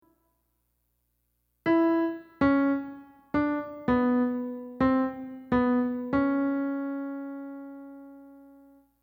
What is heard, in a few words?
Outro harmony slower on Piano